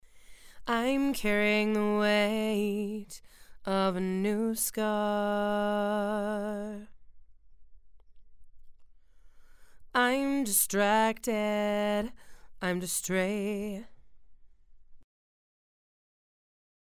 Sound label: Female Vocals